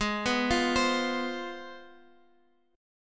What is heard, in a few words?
C Major 7th Suspended 4th Sharp 5th, chord